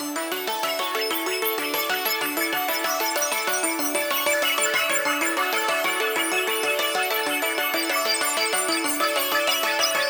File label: Arpy thing